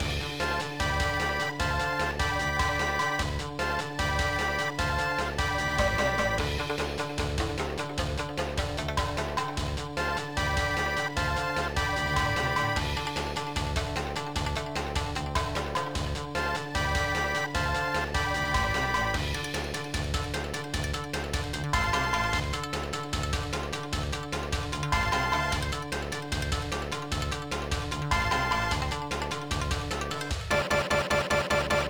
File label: Stage Loop